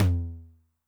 Noise Med, 909 LOW TOM

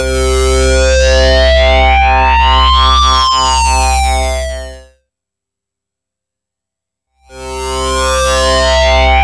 C3, Synth Line; 90 s Sample CDs; NiceBeats; SynthLine VOL-21

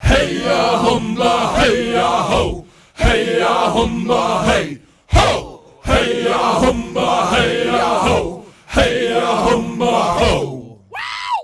"heyaumba", shouts; Best Service; Hyper Dance

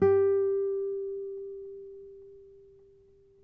NYL G, FLAMENCO NYL; Spectrasonics; Hans Zimmer Guitars VOL-2

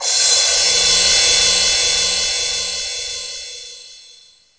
R-5 Mallet Crash Cymbal, Roland R-5

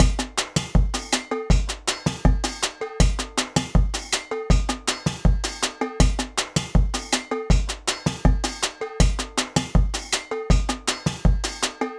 junkyard rhythms, drums

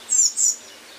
Birds Various

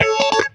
GTR, Roots Disco; USB Soundscan vol.32; AKAI

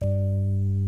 60's Organ

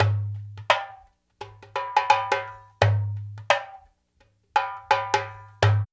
DUMBEK, Funky Ass Loops; East West

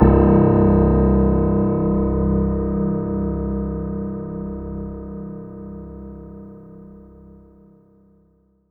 C1, Synth Line; 90 s Sample CDs; NiceBeats; SynthLine VOL-23

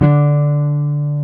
GTR, Jazz Guitar; 90's Sample CDs; Club-50 - Foundations Roland; Jaz-Kessel

